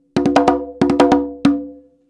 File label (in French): frise, congas